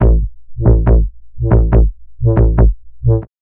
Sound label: TECHNO; Techno eJay 4 Samples